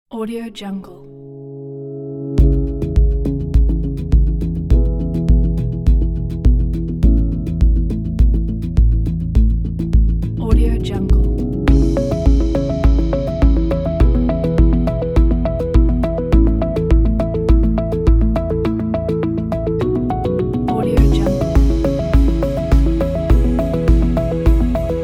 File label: heartful ambient